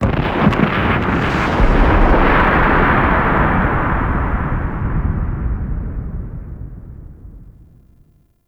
Environmental Booms B; Ambience; Warframe; Man In The Wall

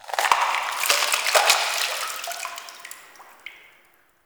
Interior Drip Goop Run; Ambience; Indoor; Warframe; Infested Eris

